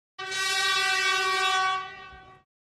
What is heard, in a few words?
Federal Air Horn Siren; Long Steady With Good